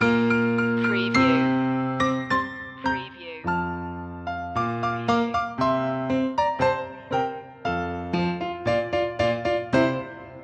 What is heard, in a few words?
piano loop end; deck the halls; media music now